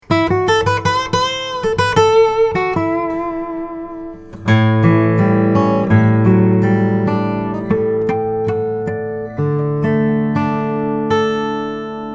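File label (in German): TLM193 Gitarre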